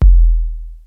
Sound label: GROOVE KIT, ENSONIQ TS-10; Rubber Chicken Software Sample Bank